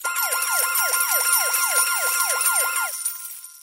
Jackpot Animation; Lotus Garden

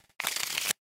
Bonus Shuffle; slots; House Of Luck

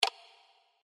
btn continue; Cash Honey

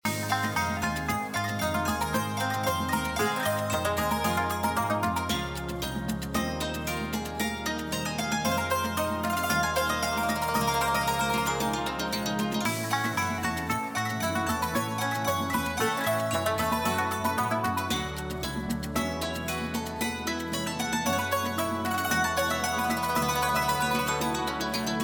BGM Free Spin; Wealth Gods2

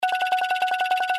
Min Total Winning Count Up; slots; Valentine Blast

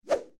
SFX Number Switch, slots; Panda Majesty2

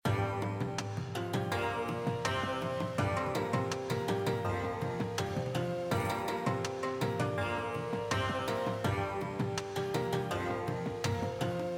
BGM Free Spin, SLOTS; Wealth Gods

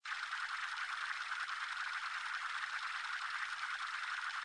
reel spin; slots; Sushi Suprise

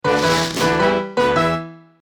Symbol Win; FinWin

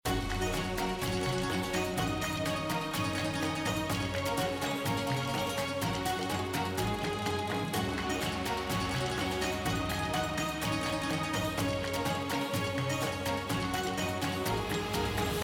BGM Lucky Pick; Wealth Gods2

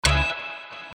spin stop win, slots; GalaxyQuest

